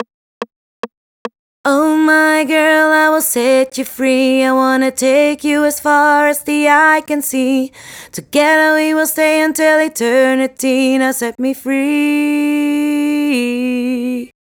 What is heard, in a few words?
Chorus 2nd Voice, 144 BPM; Vengeance Samples; Set Free